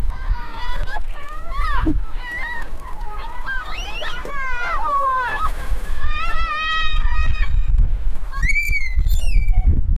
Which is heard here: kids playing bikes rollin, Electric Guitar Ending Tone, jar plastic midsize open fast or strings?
kids playing bikes rollin